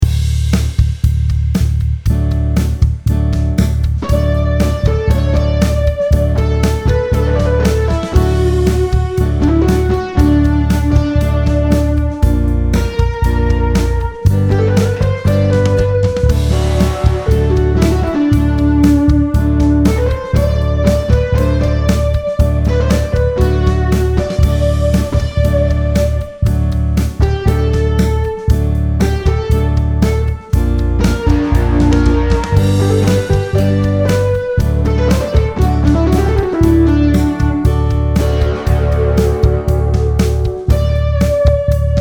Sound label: guitar test